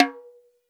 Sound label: TOY SNARE; AKAI S6000 CD-ROM - Volume 1